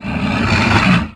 vox attack

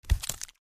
trog foot

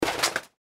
mantis land; npc

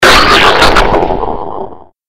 mirelurk death; magmalurk; npc

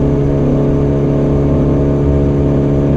apc mid

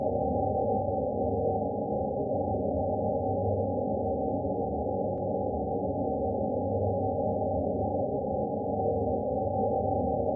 room tone creepy; blackforest

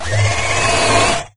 imp sight; doom3

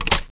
clip in; tfa cso; bendita

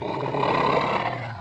ambient

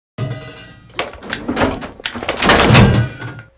sherman reload, simulated vehicles weapons

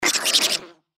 giant rat chase vox; npc

